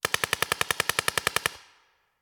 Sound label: Paintball Gun; Sound Ideas; Guns